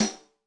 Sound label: Tamb Snare; Yamaha DTXpress-II